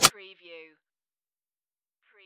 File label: hover